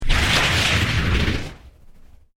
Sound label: Created Creature Medium Exclamaition, Alien Sound Effects; Created creatures, vocalisations and pretend speech